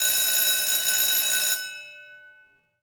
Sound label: firebell; oklahoma